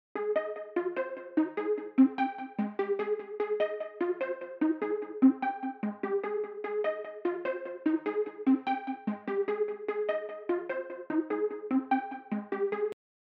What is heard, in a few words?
148 BPM F Maj Pluck; Cymatics Gems Vol 5; Astro